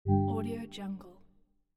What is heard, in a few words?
wrong